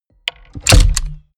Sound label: mechanics large lock